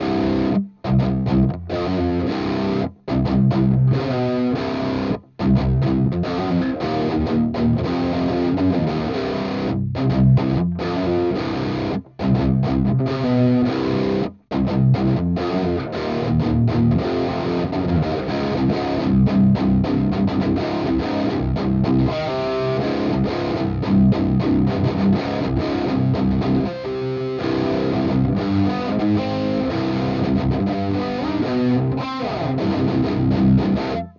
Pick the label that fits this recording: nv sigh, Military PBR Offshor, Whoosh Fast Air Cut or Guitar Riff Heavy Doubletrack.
Guitar Riff Heavy Doubletrack